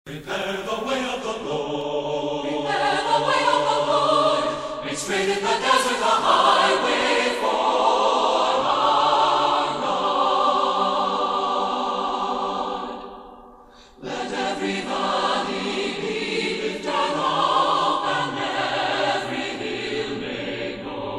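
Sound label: Choir in English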